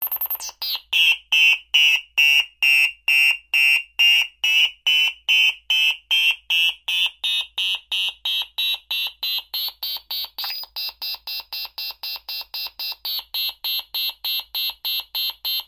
Alarm Car Type 1; Modulating Fast Beeps And Chirps Speed Up And Slow Down Various